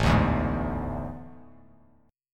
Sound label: A Major 7th Suspended 4th, Bass Standard; AM7sus4; strummed; JGuitar